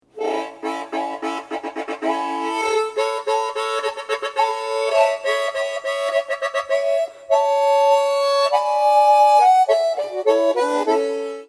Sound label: Harmonica Sample